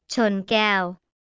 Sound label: cheers